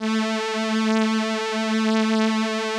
VP-330, String Machines

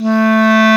clarinet A 3; East West; Global Sounds

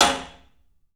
CLUB SNARE; 3D Sound; 3D Drums

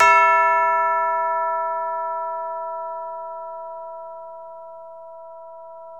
E2 CHIME, ORCH. CHIMES; Northstar - New Gold VOL-2

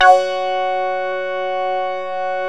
SYN, SYN Analog; Sound & Vision; Gigapack I CD 2

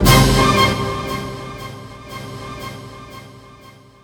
ORCHFX, orchestra, Asian Traditions

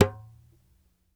Dumbek Semi Mute; Sony CS

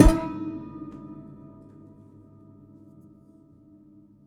Prepared Piano; Battery 3 Sample Kits

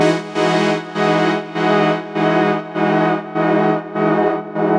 Pad 100 E, sidechained samples 100bpm

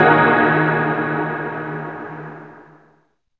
SYN, Roland, SYN DIGITAL; Sound & Vision - Gigapack I CD 2 (Roland)

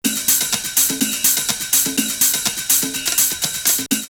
Agoa Agoa 124, drumloops Techno&House; X-Static Goldmine

